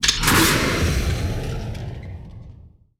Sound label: sfx sci fi; Masterbits - Switch FX